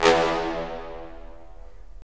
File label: BRS, Roland LA Composer